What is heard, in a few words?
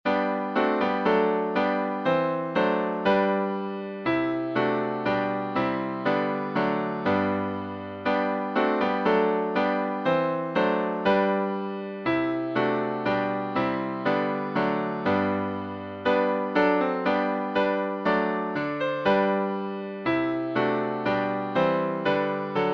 Hymns